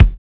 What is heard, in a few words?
LO FI BD, Drums Dance & Groove; USB Soundscan vol.11; AKAI